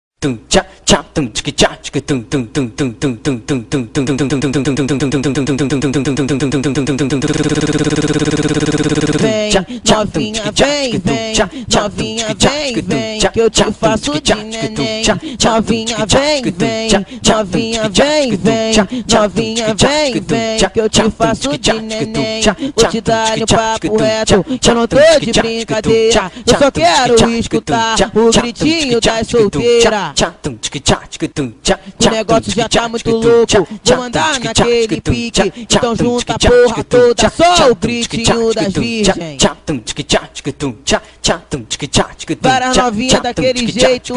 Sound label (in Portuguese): funk batidas